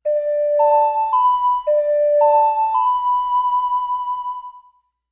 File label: Ocarina; Legend of Zelda Majora's Mask 3D